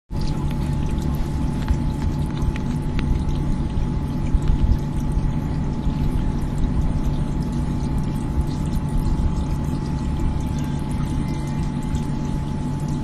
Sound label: drainpipe and generator at manchester university